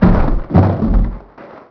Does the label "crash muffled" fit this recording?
Yes